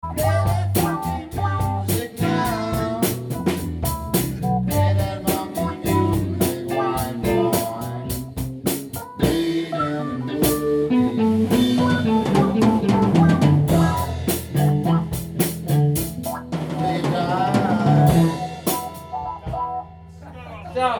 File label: Funky Music